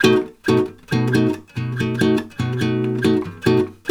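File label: B 7 guitar, Brazil Bossa; USB Soundscan; AKAI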